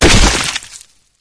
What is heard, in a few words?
bodyfall combat form; flood